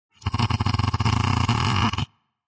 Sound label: Alien Vocal Sound, aliens